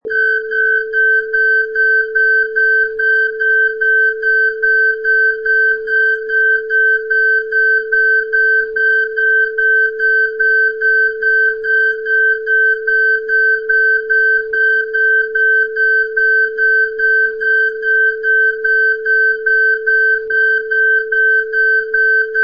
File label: Fire Alarm Long